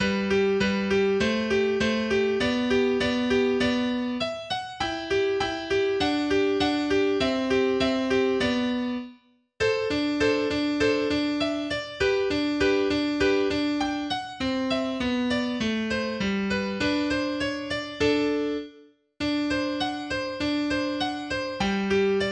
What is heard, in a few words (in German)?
Andantino in G Dur, mehrstimmige Gitarrenstücke; Carulli